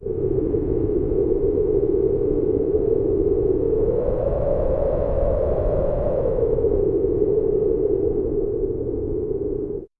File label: light wend, light breeze